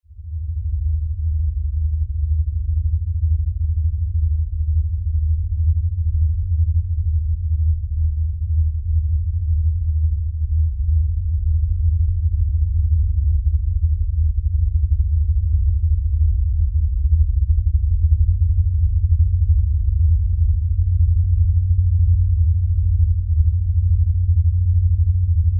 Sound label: Lfe Drone Low Bass Rumble; Drones